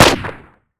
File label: glock fire; weapons